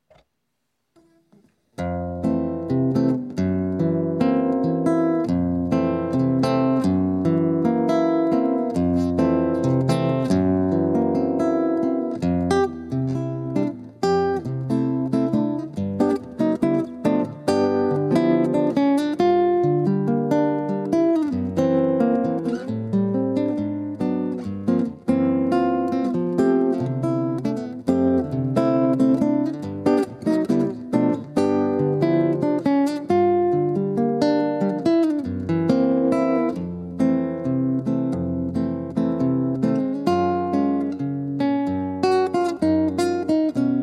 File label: Solo